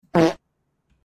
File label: FART; memes